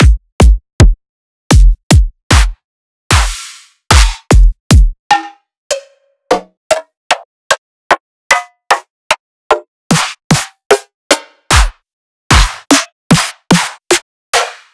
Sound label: Drum Hits